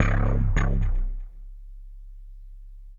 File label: AMBNT 160; Ambient